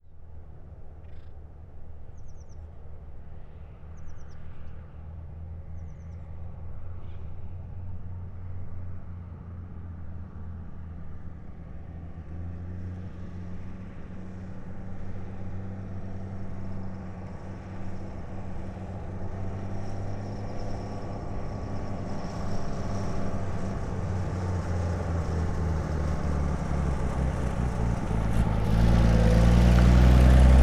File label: 1929 Sedan Ext Slow By; Period Vehicles